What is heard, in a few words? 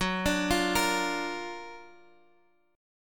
Gb Augmented Major 7th, down, Guitar, Half Step Down